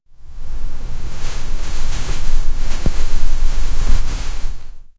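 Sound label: a soft and gentle crawling, carpet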